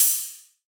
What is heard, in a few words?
Open Hat, 140bpm, Ebmin